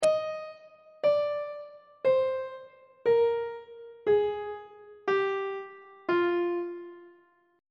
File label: f dorian mode descending; on treble clef